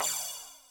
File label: crash, Millenium MPS-400